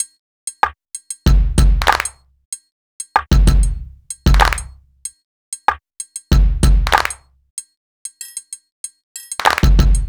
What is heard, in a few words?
Urban Beat, Drums